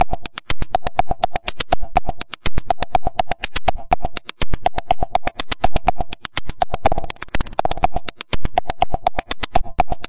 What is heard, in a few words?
techno tapdancing, drum loops and breaks